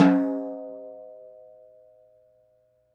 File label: Snare Open High Vol